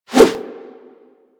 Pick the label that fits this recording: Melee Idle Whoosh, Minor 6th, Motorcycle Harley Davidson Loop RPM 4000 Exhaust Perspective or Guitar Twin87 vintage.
Melee Idle Whoosh